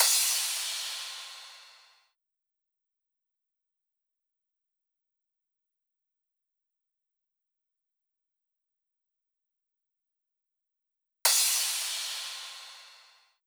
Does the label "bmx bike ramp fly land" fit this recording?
No